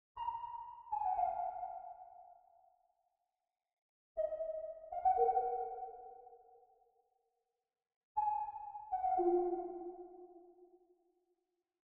120 BPM D# Min Lead, Loop Stems; Cymatics; 2022 Melody Mystery Expansion; Slowly Drifting

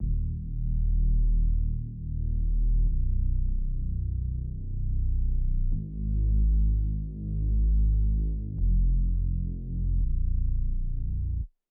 84 BPM D# Min Bass; Loop Stems; Cymatics; 2022 Melody Collection Platinum Expansion - Pt 2; Tonight

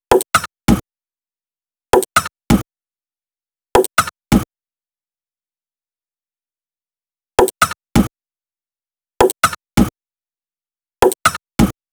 Drum Loop - 132 BPM Percussion; Loop Stems; Cymatics; Vanquished Drum Loop

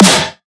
Snare, Dubstep Drum Shots

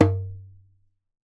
Djembe; World Percussion; Cymatics; Emperor World Percussion

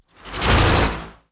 Break Mono Jolt

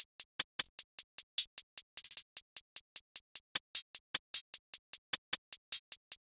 Hihat Loop 152 BPM; Make You Mine

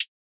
Hihat, Trap Drums; One Shots

